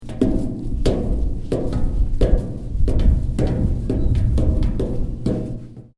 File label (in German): Tritt gegen Rutsche